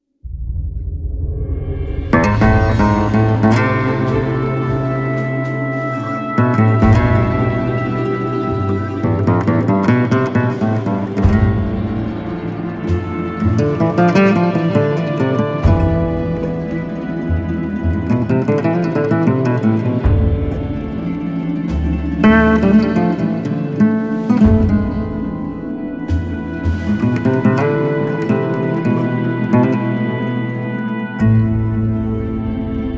Nylon; Rico

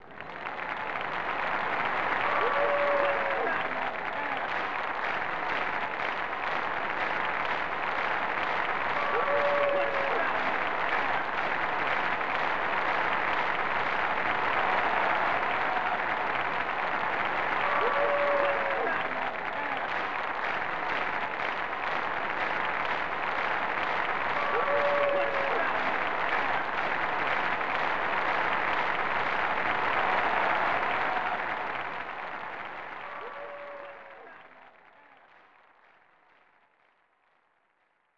Applause; power drive 1994 snes

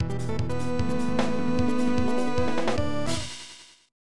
PK Announcement; Super Formation Soccer 96; SNES

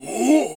zombie guard club prepare

